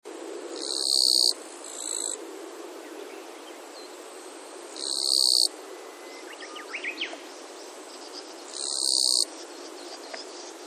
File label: bug